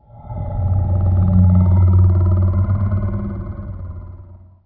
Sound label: Ambient